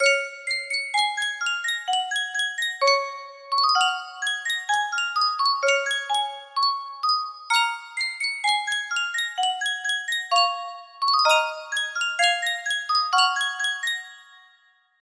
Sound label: Sankyo music box